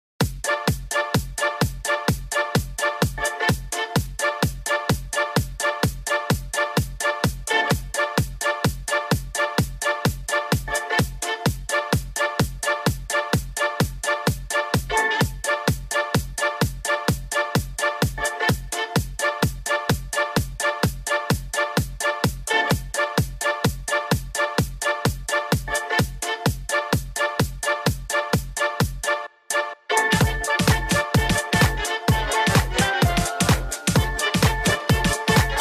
Remix Gaming Background Music

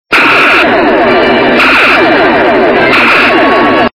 Phaser sound

